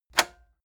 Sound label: cassette eject release heavy